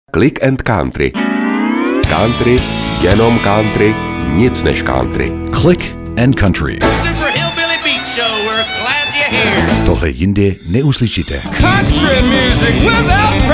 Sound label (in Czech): country jingle